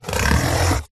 cardboard scratch rubs fingernails; foley; zapsplat

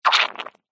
foley liquid foamy squirt squelch dribble foamy

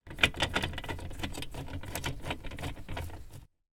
household blind venetian tilt rod turn

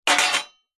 household baking tray metal impact drop

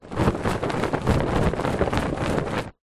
household pillow case shake

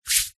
human hands dry rub together single; zapsplat

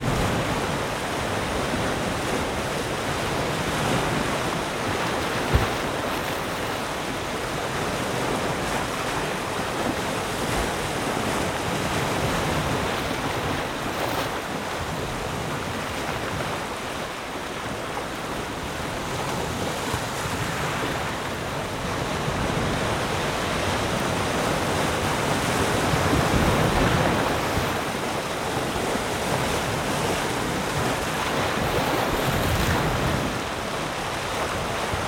water ocean waves rocks light; sound effects one

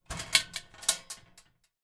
household bbq metal lid unclip

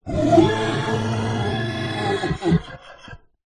horror evil demonic growl then laugh

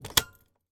van key ignition turn once mercedes sprinter

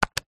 office calculator button press single; zapsplat

